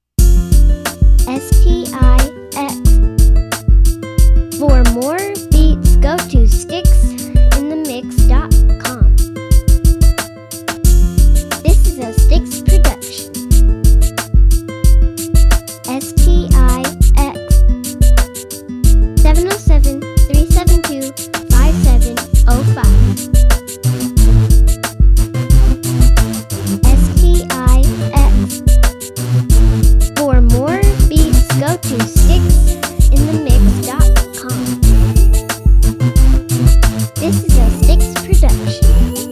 nylon guitar

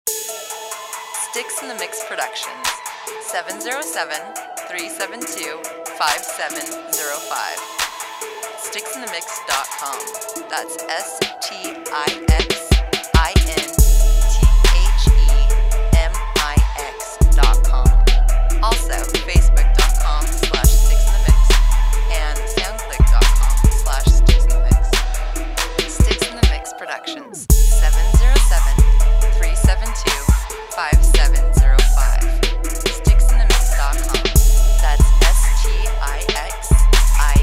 BASS TRAP

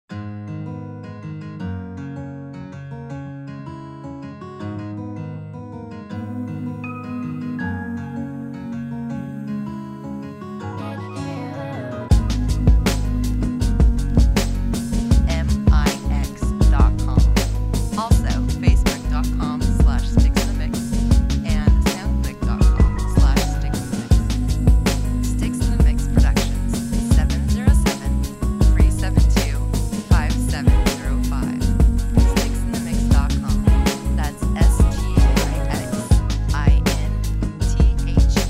girls and guitars

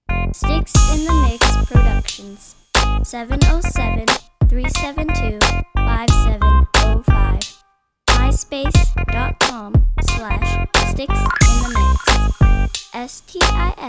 hop skip bounce

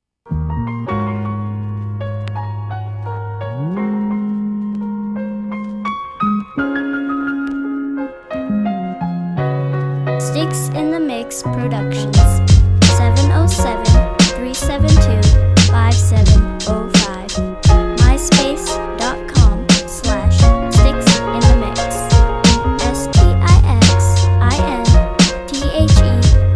beat; story

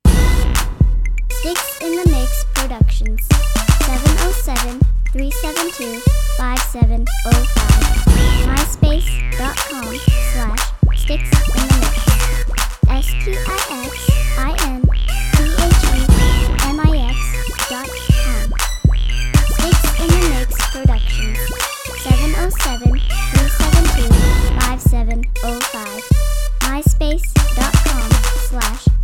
funky

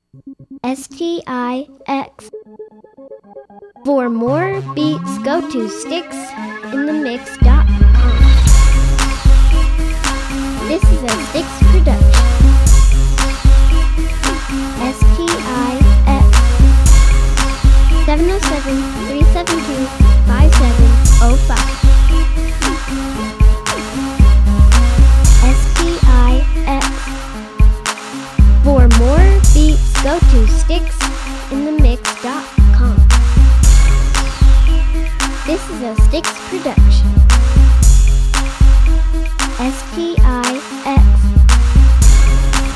sweet crunk

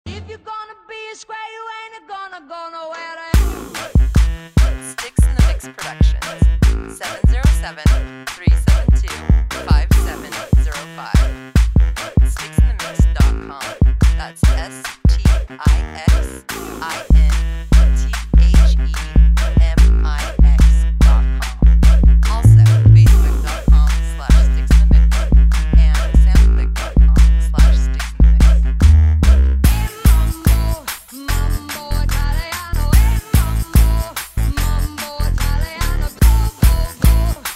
Mambo

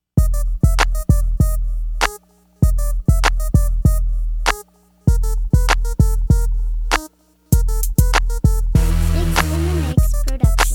crunk

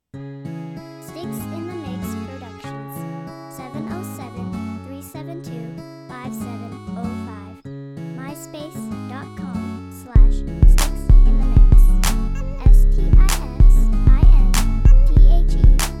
guitar; guitaro

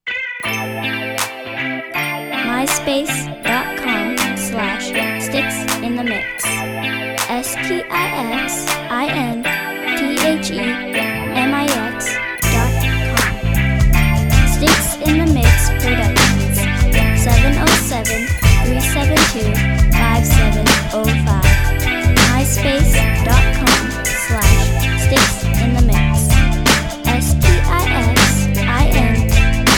spring funk

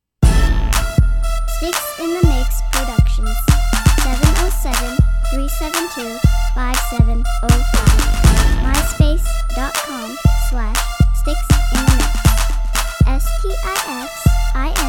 funky

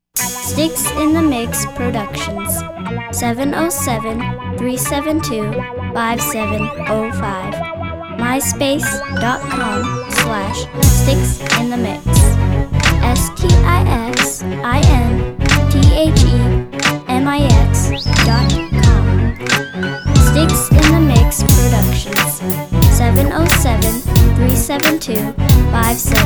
Violin triplets

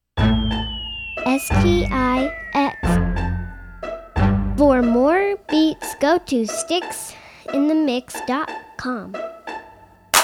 march crunk

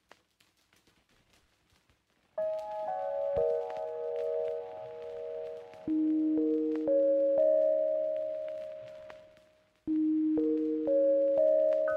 Chill Trap

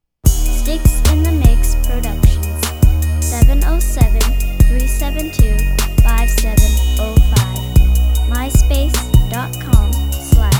bells, beats; south

